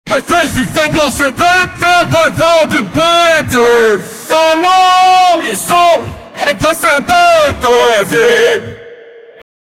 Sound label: everyone screaming, chant